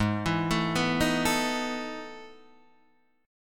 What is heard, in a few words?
E Major 11th chord